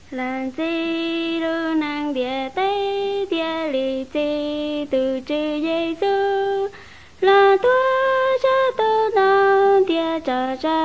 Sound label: songs hmong white